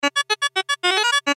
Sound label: Melody Silly; Musical; Toy Melodies; Cartoon; Toy Melody Sound Effects; Sound Effects:Toys